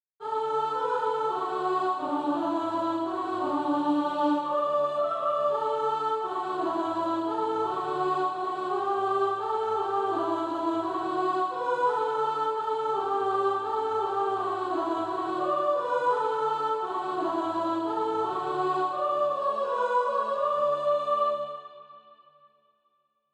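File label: soprano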